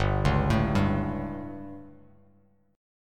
G Suspended 2nd Flat 5th G down Bass Standard, chord; Gsus2b5 arpeggiated; JGuitar